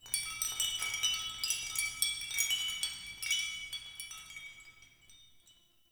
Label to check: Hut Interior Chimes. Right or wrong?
Right